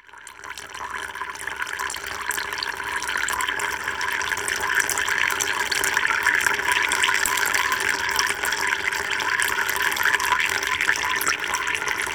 water dripping; best service Blue Box; water fx

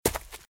Footsteps - Leaves - Run - Right; RadwinIsland

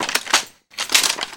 Gun Weapon Pickup; SFX Kit; Pickups

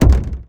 hit human hood ricochet; Blind Drive

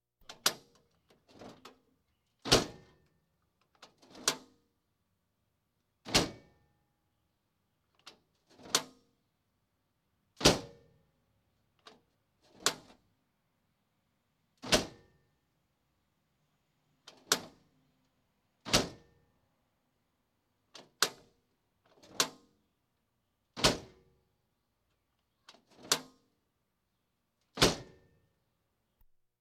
Police, Fire engines

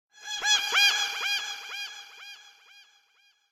Gull Eerie Croak - Flies Away; Hollywood Edge; sound designer's toolkit v2

